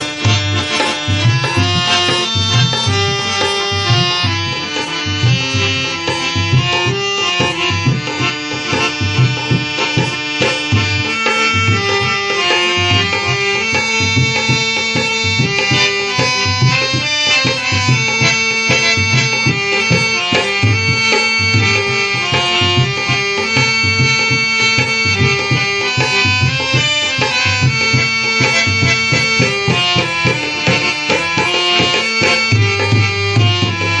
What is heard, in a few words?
DHOLAK WITH HARMONIUM RINGTONE